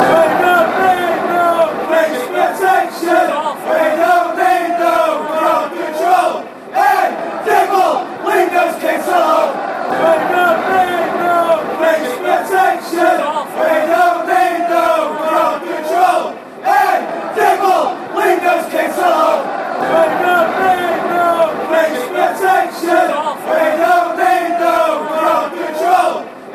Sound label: chants; hey dibble